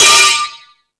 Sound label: Rap Session, Drum Machines E-MU Pro-Cussion